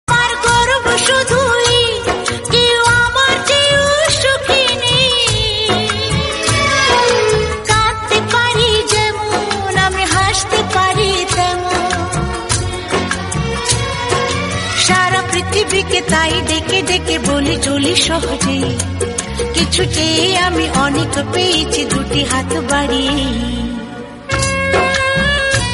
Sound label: BENGALI RINGTONE